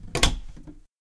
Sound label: Door Close